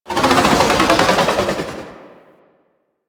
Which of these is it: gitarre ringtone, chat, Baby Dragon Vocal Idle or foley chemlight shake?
Baby Dragon Vocal Idle